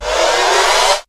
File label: HIT, STABS, House